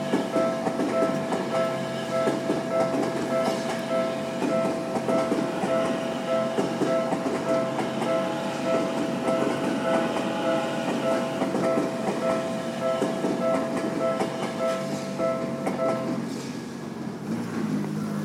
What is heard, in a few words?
Train @ Hibarigaoka